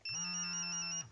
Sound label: nokia 5510 ringtones; mosquito